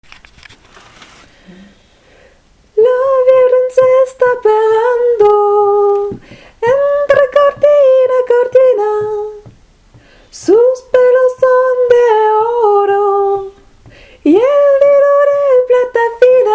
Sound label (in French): aigu